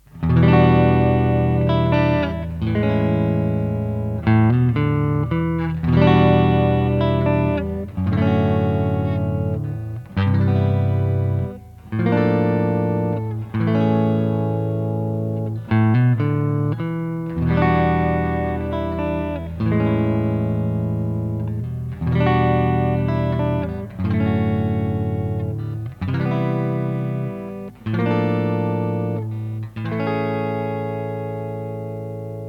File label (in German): Hals PU clean